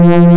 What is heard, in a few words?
SAW BEND